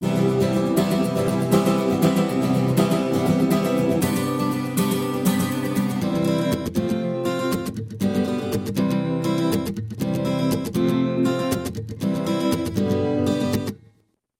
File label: Modulated delay